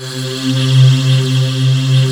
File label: COOL VOX C2, COOL VOICE; AKAI S-Series CD-ROM Sound Library VOL-7